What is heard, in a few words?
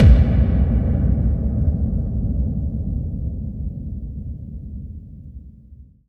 BD-FX, Dance Mega Drums; Best Service